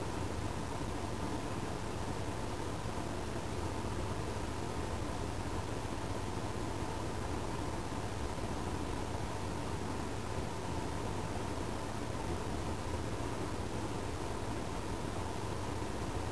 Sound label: ventilation